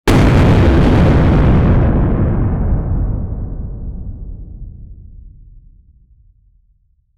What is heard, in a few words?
nuclear explosion